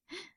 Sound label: Girl shock